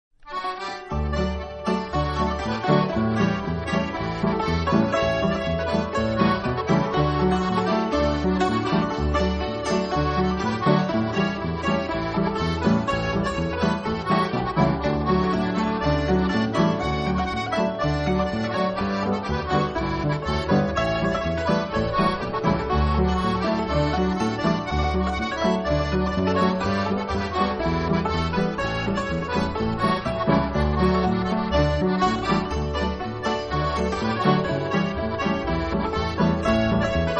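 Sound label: spanish two step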